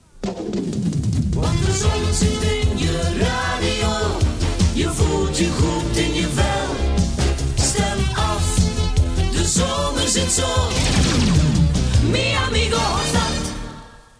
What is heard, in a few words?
jingle; Mi Amigo Hofstad